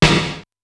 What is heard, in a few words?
SDS2000 SN; SDS2000 Factory8